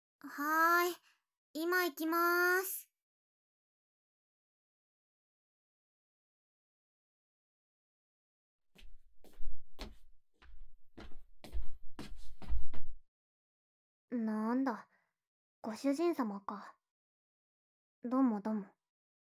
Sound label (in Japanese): メイドさんのゴリゴリ耳かき